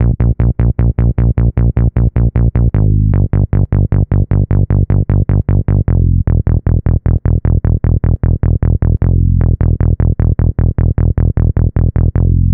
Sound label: Boosta Bass; Basses; Dr. Fink's Funk Factory